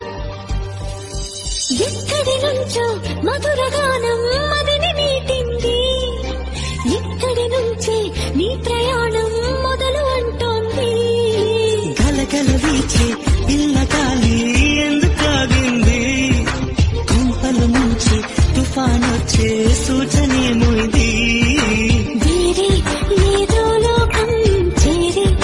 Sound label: Telugu Song Ringtone; Gudumba Shankar